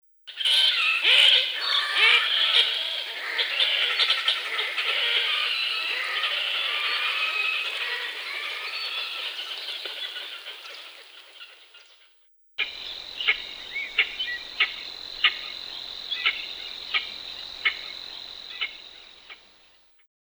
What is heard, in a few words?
Podiceps grisegena